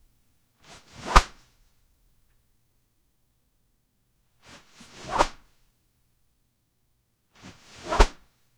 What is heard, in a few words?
bag flap sound quiet